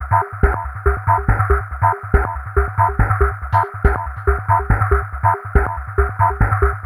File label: Electronic, Drums & Percussion